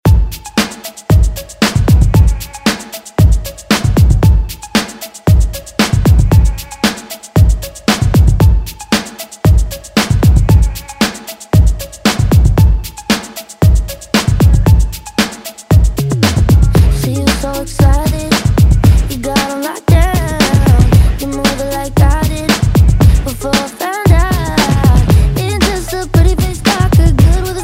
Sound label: Top40 Redrum